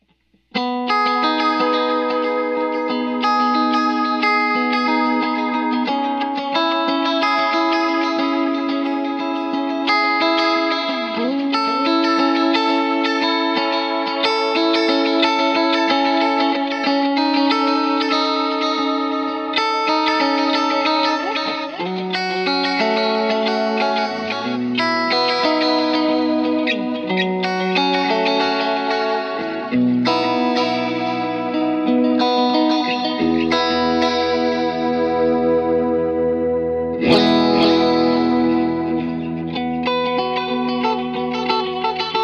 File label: basic tone dynacomp